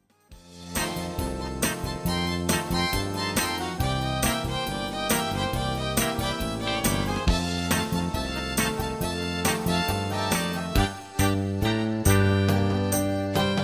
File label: Mexican Blues; Abenteuer Klavier Erfolge 3 Hauptband